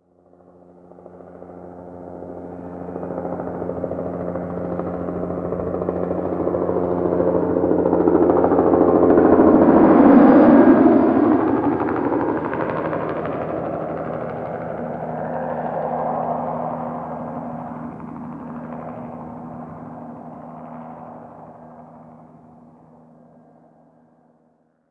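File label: helicopter flyover stereo; amb elements; modern warfare 2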